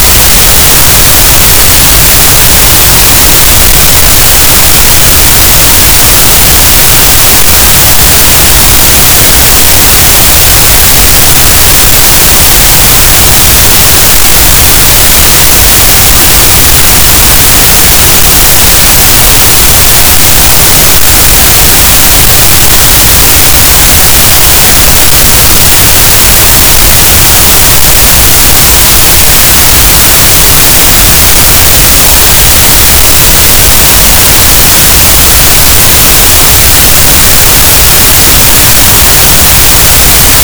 cachalot negatif, Physeter Macrocephalus, gaussian noise